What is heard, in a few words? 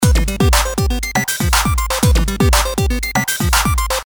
filter